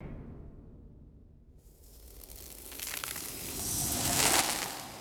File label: shimmering magical whoosh and crunchy crackling; magic-scifi